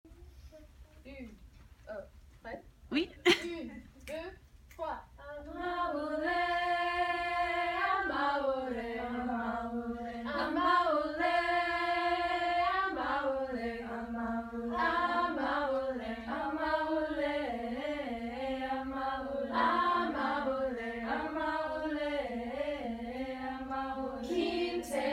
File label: Chorale